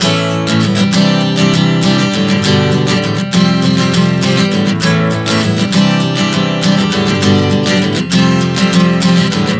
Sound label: Progression 100 A-Cadd9-G-F, Acoustic Guitar, Chord Progressions 100 bpm